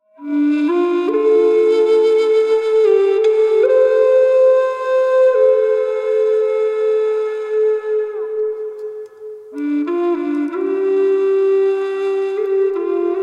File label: Song Key D sample